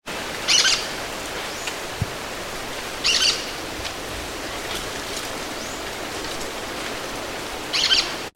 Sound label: aratinga cactorum